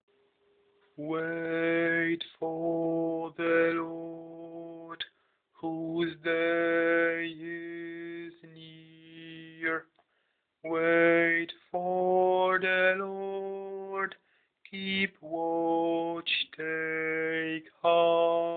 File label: Wait for the Lord alt